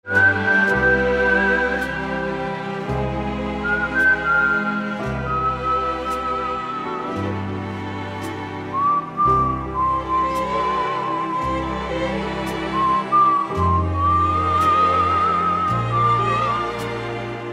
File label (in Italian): fischio e orchestra